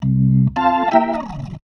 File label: B3 FALL C